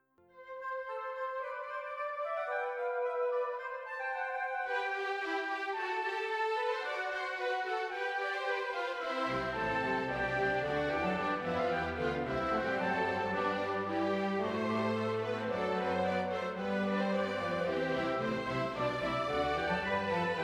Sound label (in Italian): Doppia Fuga a quattro voci in do maggiore Orchestrazione; di Gerd Prengel